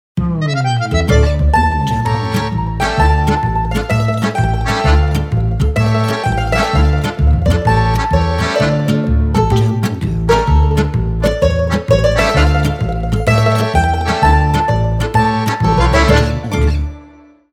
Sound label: Italy Intro Logo